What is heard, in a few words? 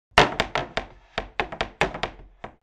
Wooden rattling and vibrating earthquake designed